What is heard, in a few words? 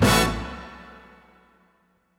last chord